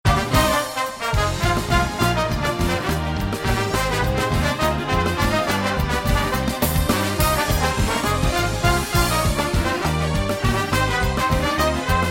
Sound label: bolivia band; latino